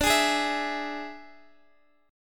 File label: D Suspended 2nd Flat 5th D Guitar DADGAD